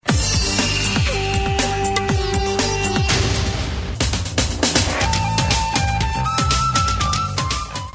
Bangla Tone

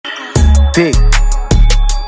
Thick ringtone

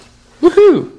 Woo Hoo

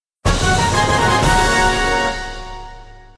Level Up

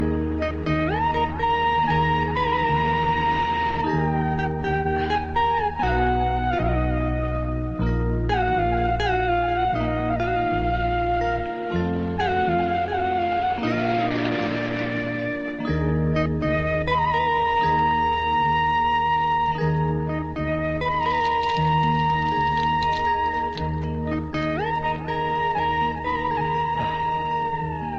Hindi Melody

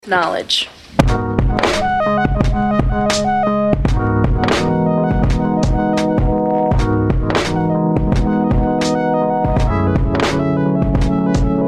Beat

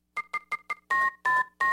Old Phone

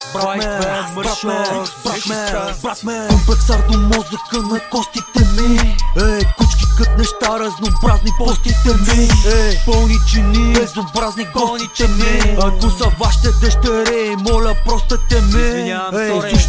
Trap

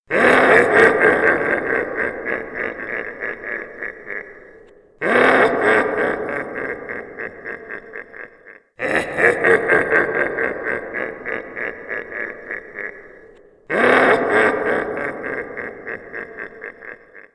Alt Predator Laugh

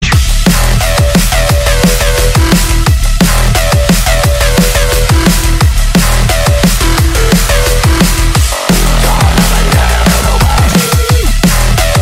New Age

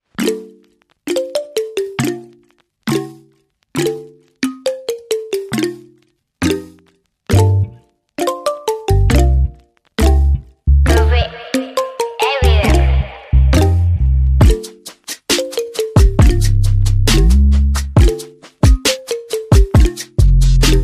inst

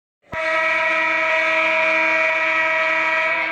Bulls buzzer

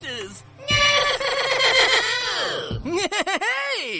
Laugh; Wizard Todd

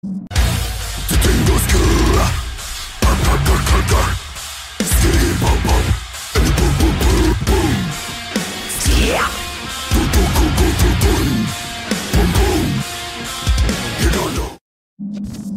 death metal

